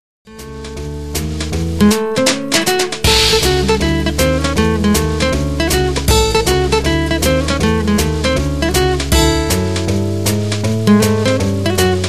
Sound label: GUITAR SWING